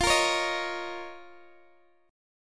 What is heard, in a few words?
F 7th Suspended 4th Sharp 5th, Guitar DADGAD; x,x,15,15,16,13